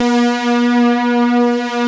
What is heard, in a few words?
VFX SYNT, Synthesizers; Soli-Music; World of Synthesizers VOL-1